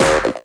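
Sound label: synth, Stabs; E Lab; XTc Files of House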